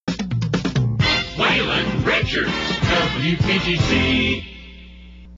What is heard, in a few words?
jingles; wpgc; jam; positron